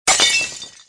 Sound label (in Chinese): 瓶子打碎